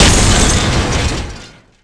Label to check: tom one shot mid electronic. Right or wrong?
Wrong